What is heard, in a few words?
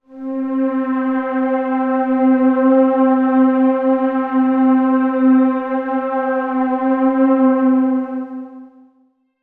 SYNTH (C), Pedal One Shots; Alligator; Cymatics; ANALOG EVOLUTION Beta